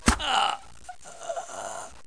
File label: grunt; CLOSE COMBAT